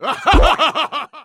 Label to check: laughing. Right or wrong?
Right